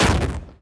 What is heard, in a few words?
explode; horizontalrunner